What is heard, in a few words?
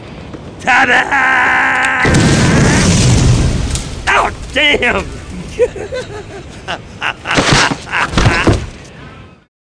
TADAAA